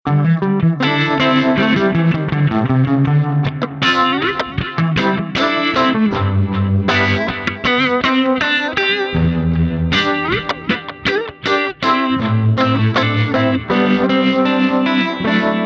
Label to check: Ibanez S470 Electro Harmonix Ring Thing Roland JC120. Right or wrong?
Right